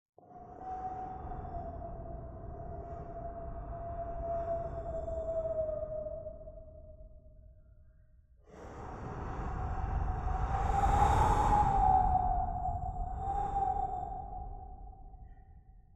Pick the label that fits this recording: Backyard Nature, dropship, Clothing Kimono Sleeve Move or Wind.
Wind